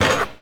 Street Ball Glass Basket; SFX Kit; Street Basketball

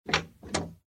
Boat Door

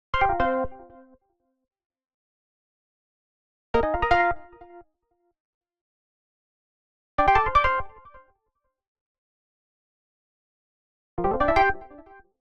Arcade Jingle Neutral

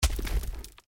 Walls; Blind Quest - The Frost Demon

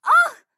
town human road hit; Blind Drive

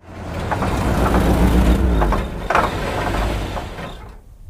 out of gas; Quentin C's Gameroom

